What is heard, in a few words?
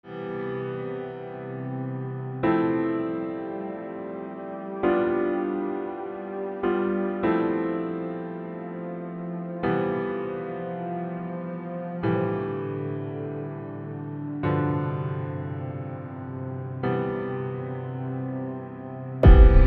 Cinematic Piano Synth